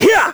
melee; Crypt of the NecroDancer

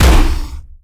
ogre downswing; Crypt of the NecroDancer

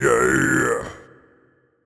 blues king attack; Crypt of the NecroDancer

